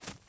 footsteps grass

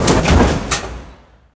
elev hangar end; theed; Star Wars Obi-Wan; XBOX

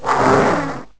moan

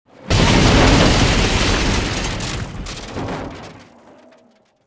drill thru; movers objects

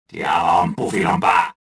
escaping

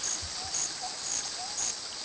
jungle; Ambient; MX vs ATV Unleashed; XBOX

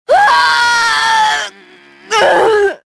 death; tavion

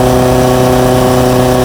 rpm, sled; WhiteOut

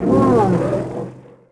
roar, creatures; bantha; Star Wars Obi-Wan; XBOX

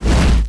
flaming Axe swing; weapons

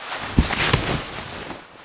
hit flag; hits; Tony Hawk's Project 8; XBOX

